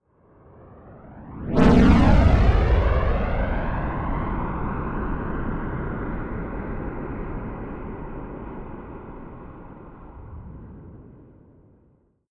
rocket large flyby whoosh fast left to right close